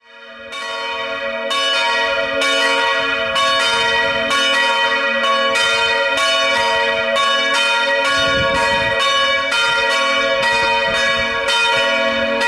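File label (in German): Tonaufnahme, mehrstimmige geläute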